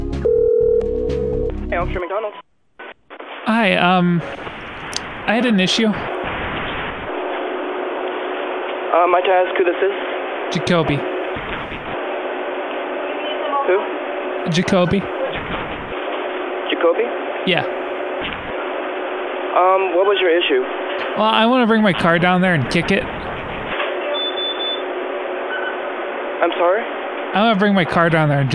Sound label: Car Kicking